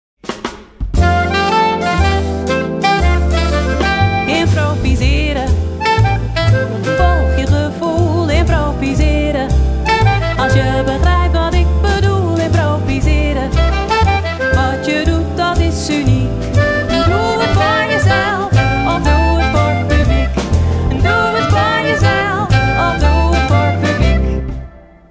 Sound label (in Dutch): refrein improviseren